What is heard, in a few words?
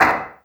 Oneshot